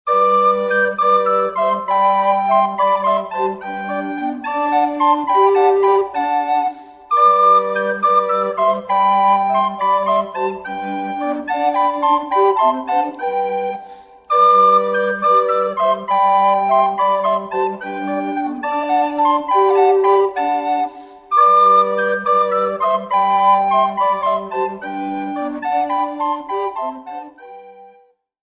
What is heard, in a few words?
Allegro